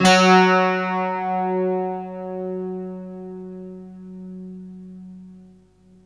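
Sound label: GUI, Slow Strings; Optical Media International - Sonic Images Library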